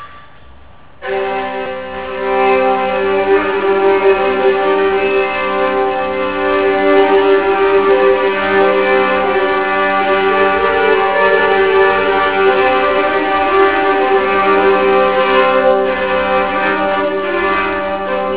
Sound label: crwth